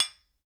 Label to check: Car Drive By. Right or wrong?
Wrong